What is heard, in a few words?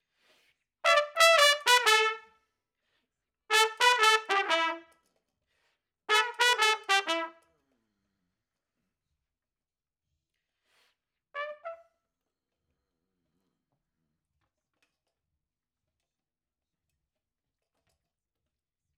Trumpet Room